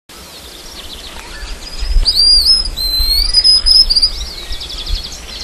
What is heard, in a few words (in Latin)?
Xenopsaris albinucha; Ceibas